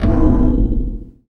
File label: GROWL, Gated FX; Optical Media International; Sonic Images Library; SI FX Vol 4